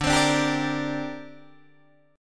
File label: D 7th Suspended 4th Sharp 5th down Guitar Open D